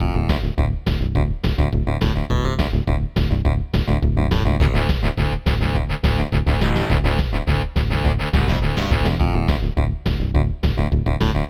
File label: High Speed Highway; Cars Supercharged; Leapster